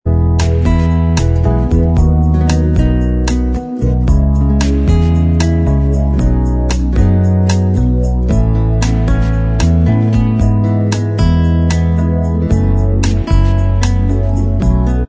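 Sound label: bossa version instrumental, backing tracks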